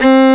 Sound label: violin; gmplay